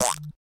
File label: Squeeze, notifications Fun; Samsung Galaxy S23